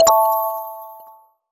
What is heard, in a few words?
HUD Pause